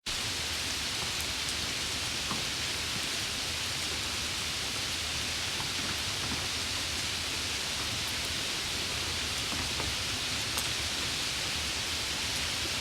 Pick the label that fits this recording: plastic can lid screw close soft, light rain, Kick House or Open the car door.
light rain